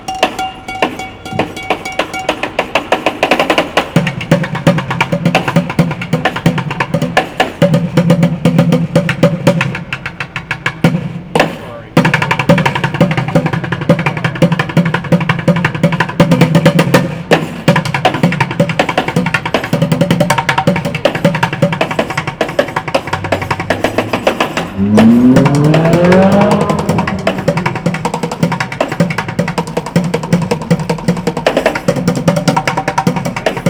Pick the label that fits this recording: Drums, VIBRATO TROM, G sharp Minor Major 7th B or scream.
Drums